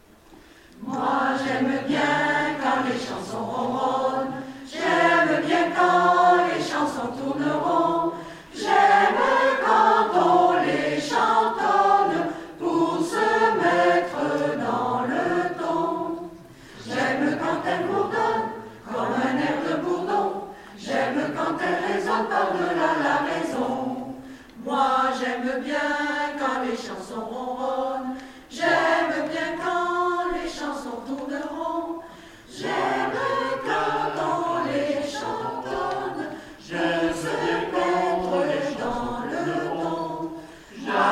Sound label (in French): chansons qui ronronnent